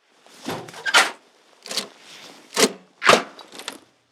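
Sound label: weapon minigun reload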